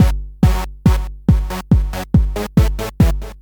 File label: Beat